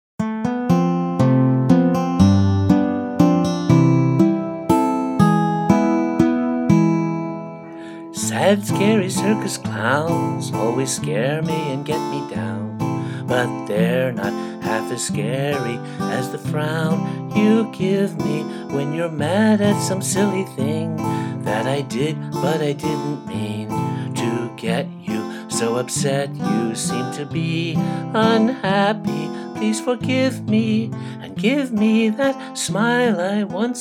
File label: Sad Scary Circus Clowns